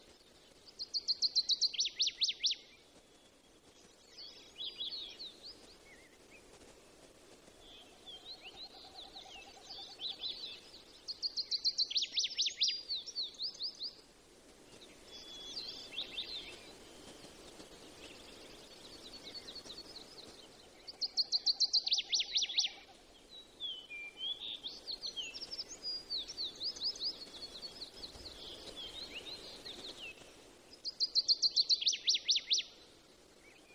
Northern Waterthrush Song With Chorus; alaska acoustic highlights

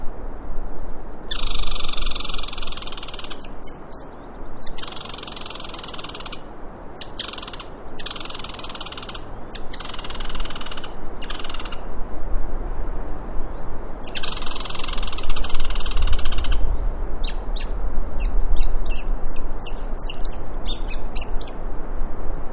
American Red Squirrel Calls; alaska acoustic highlights; MAMMALS

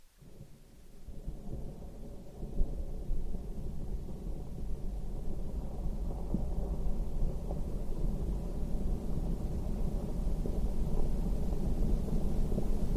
Rock Slide at Kahiltna Glacier; alaska acoustic highlights; GEOPHONY

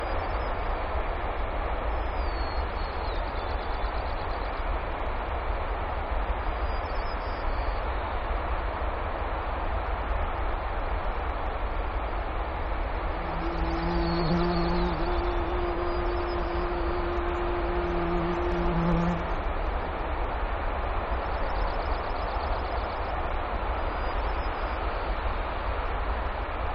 Bumblebee and Avian Chorus; alaska acoustic highlights; INSECTS

